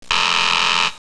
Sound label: Wrong; Easy Fishing